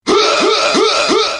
Scratch Rap

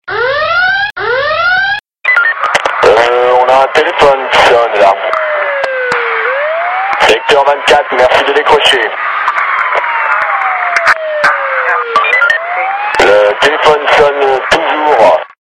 Alerte Police

